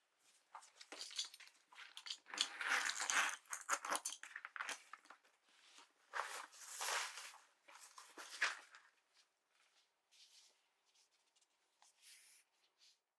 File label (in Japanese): カバンから取り出す